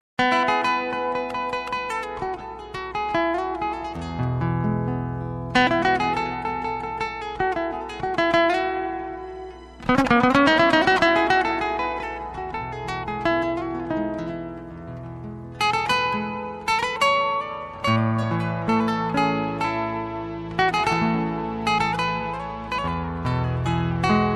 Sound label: Guitar